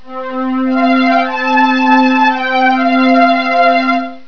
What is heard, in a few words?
STRING